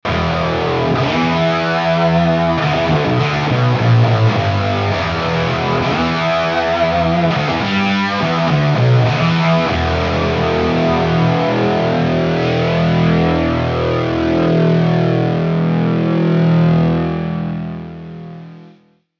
Synthy Lead

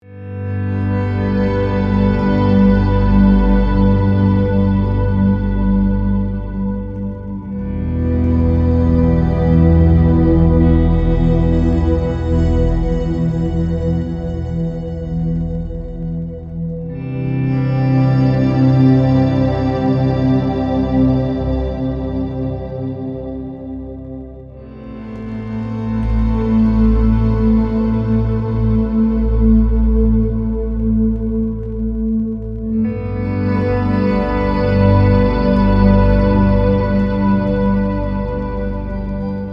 Ambient Octaves

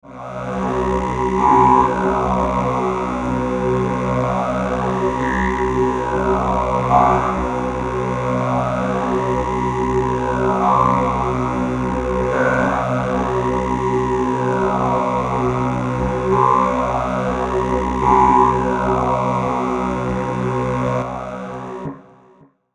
Digeridoo Axe

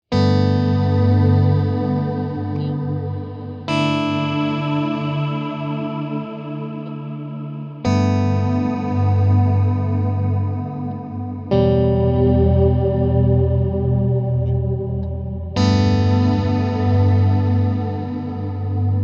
Bloom Reverb

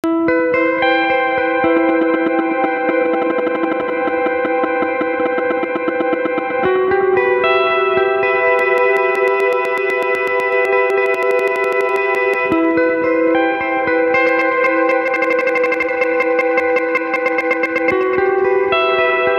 Stutter Loop

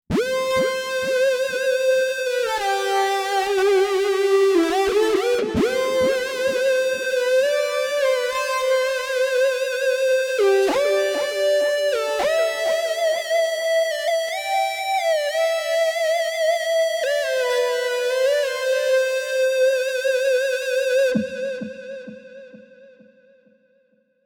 Moog Superlead

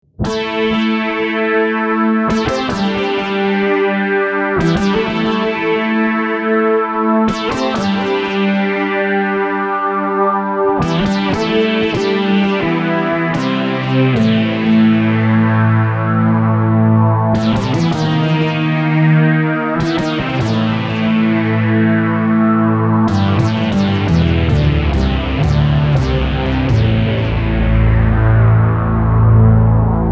moog filtatron

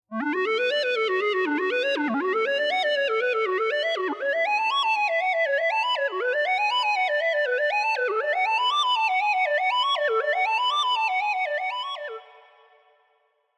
synthy arps

